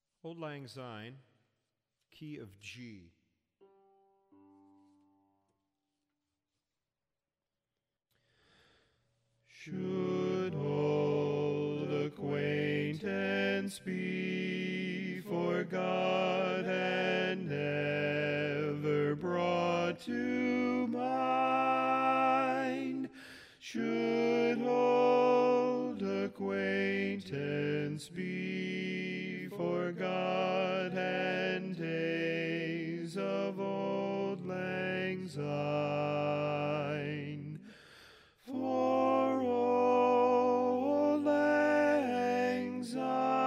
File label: Auld Lang Syne bari, Grand Chorus